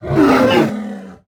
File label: Tiger Death